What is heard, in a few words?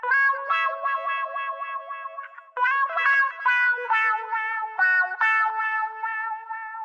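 wah wah guitar melody, 140 bpm; GUITAR LOOPS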